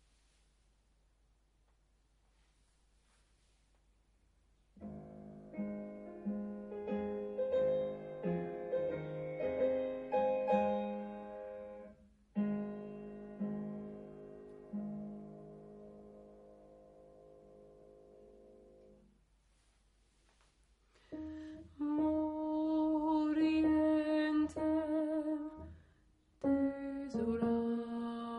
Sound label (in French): stabat mater alto partie; Grandval n4